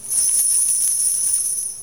coin choli

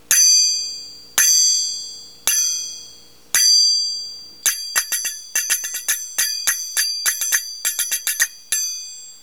thin zills

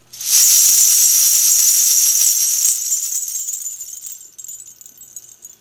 gold coin belt, Belly Dance Belt Heavily Coined

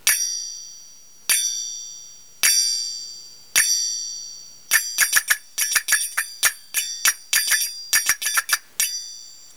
thin zills

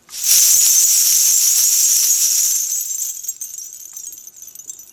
silver coin belt, Belly Dance Belt Heavily Coined

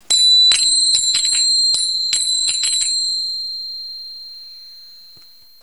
embossed brass zills

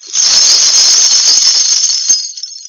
coins mirror bra, Coin Bra with Mirror and Bells

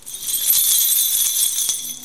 mirror necklace

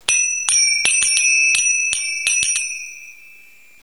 paisley zills

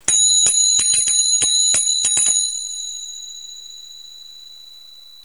turkish zills

